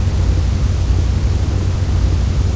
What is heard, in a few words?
Destroyer Run Loop; Civ4 warlords